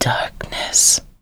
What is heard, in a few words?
Spider